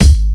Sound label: 2000s original hip hop kick drum sample c sharp key